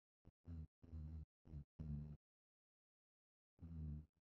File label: guitar